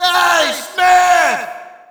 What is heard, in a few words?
Shout, audience; UFC Tapout 2